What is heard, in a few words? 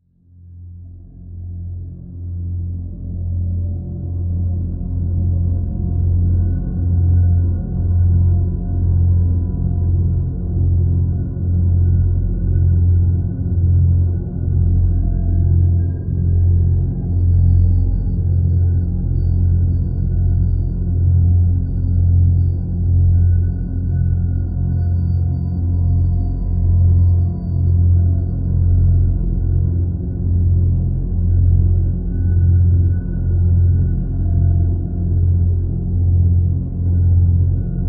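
Drone Deadly F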